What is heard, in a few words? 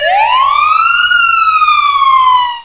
SIREN, sirens; alarms